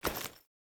Female Foley Action Default Melee Swipe, Default Clothing; Characters; Player